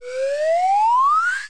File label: rise, cartoon